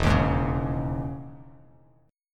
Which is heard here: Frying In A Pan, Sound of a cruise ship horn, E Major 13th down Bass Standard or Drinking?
E Major 13th down Bass Standard